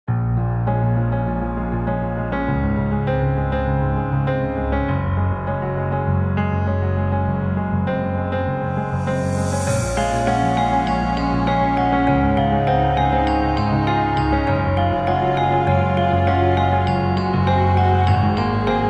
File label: Emotional Javanese Backsound